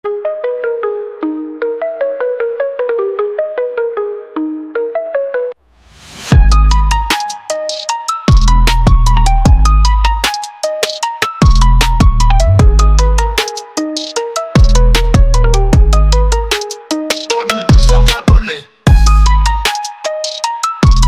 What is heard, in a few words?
promos songs Trap; Radio Libre